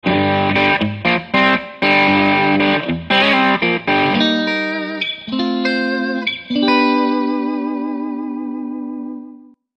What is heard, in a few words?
Fender A Strat, guitar sounds